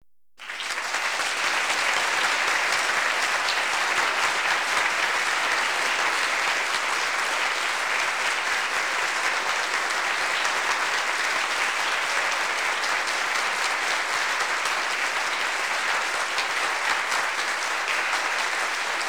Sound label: Audience Applause